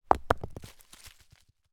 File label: rock small down on others leaves roll ground; foley